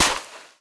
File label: step sand